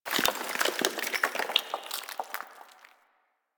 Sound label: Falling Sand Rock; Warframe; Man In The Wall